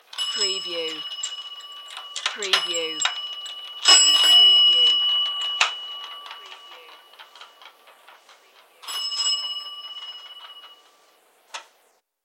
Bell Bicycle Passing

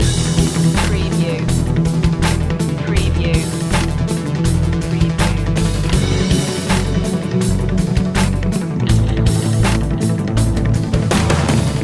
slam dunk loop

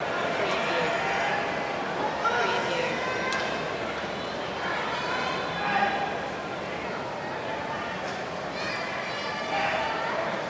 sumo match crowd roar Tokyo Japan

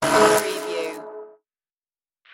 starship door access

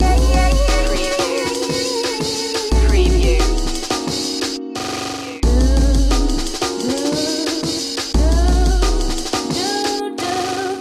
fast loop b

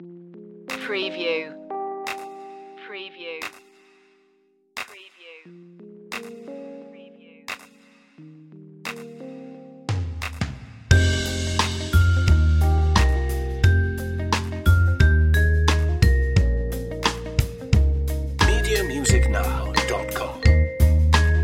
jazzy vibe

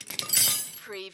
Falling Tools

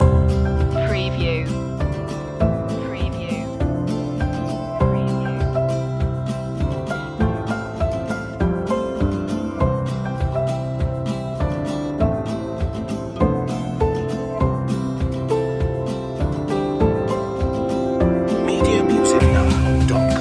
walk together no vocals

